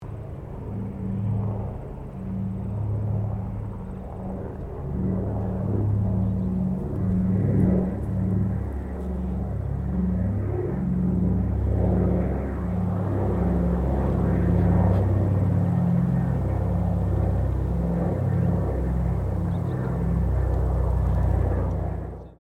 1942 PBY CATALINA PAST DISTANT, Catalina Flying Boat; Airplanes; Vehicles